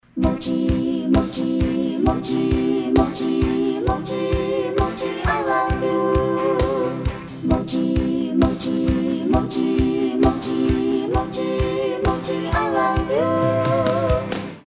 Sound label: singer